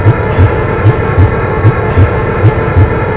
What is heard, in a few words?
beam suck; kingarth